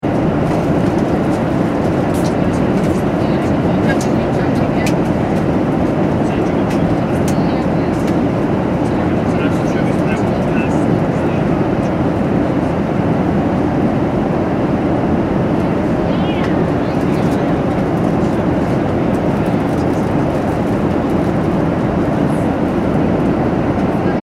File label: transportation aircraft commercial boeing 737 800 interior, In-Flight Ambience; Vehicles; Airplanes; McKinneySound